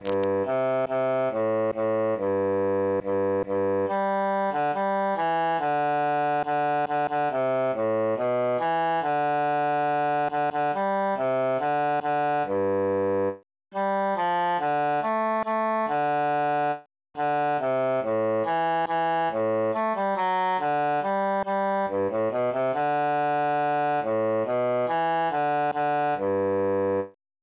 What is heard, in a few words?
bassus solo; now is the month of maying